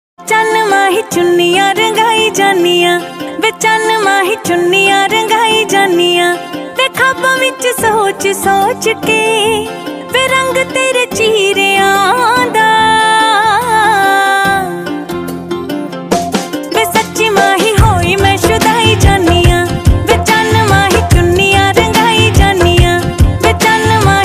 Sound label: Dance Punjabi Ringtone